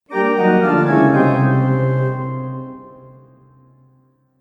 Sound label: Haunted Organ Failure Sound; Musical